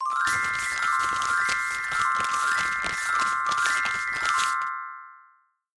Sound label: shuffle